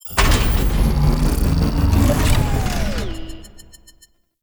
Machine Thrum; Warframe; Quests; Entrati Labs; Cavia Rank Up Scenes

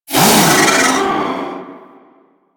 vocal pain; Enemies; Vocals; Warframe; The New War; Archon; Nira

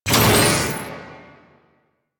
Shared Fate Chain Start; Warframe; Warframes; Pax Duviricus

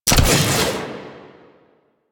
Archwing Scythe heavy attack charge start; Melee; Warframe; Weapons; Exalted Archwing Scythe

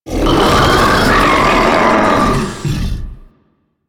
Ancient Tank Player Spotted Scream, Infested; Warframe

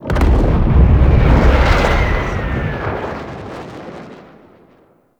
distant cannon fire; Makvoss; Warframe; Tau

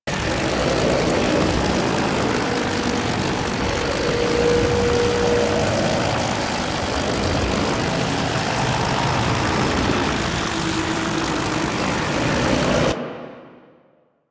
Amp Chassis Loop; Weapons; Warframe; Operators; Amplifiers

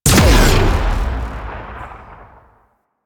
Weapon Three Shot; Weapons; Modular Weapons; Warframe; Railjack

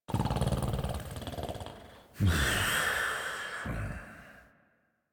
Snores; Warframe; Deimos; Father; Vocalizations; en

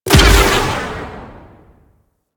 Artillery Barrage Explosion; Weapons; Powers; Warframe; Archwing

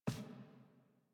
Apartment Foley; Warframe; Zariman; HubApartment

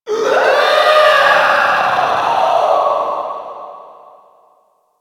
Infested Runner Dist Vocal; Warframe; Runners

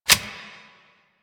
Sniper Reload Clip In; DE; Warframe; Weapons; Skins; Venkra Sniper Skin

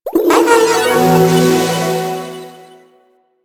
Messenger Log Out; Ambience; Warframe; Nineteen Ninety Nine

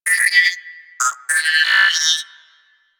Guild Computer Beeps; Ambience; Warframe

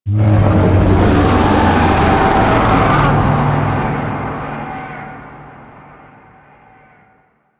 Void Dragon Vocal Distant Idle; Warframe; Duviri

